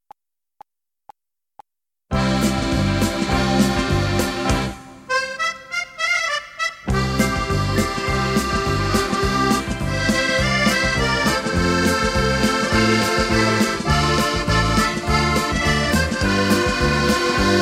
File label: Accordion, C; backing track